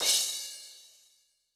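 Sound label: Brite Crash, Cymbal; Yamaha DTXpress-II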